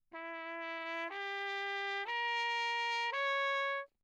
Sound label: TRP MUTE Bach; Perinétventiltrompete